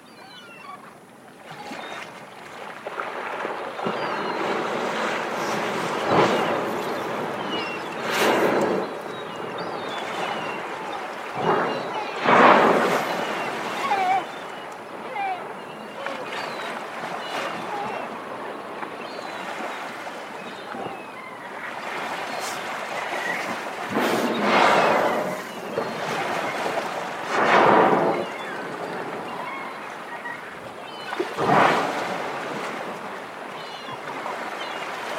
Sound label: humpback whales; soundscapes